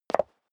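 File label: step high heels concrete single stair up, footsteps; schritte; menschen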